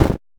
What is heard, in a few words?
footstep snow